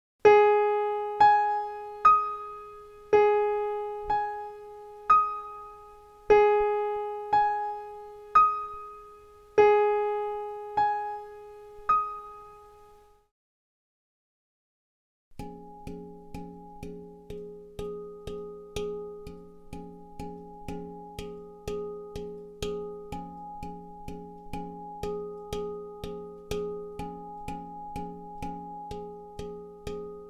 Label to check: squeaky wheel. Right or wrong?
Wrong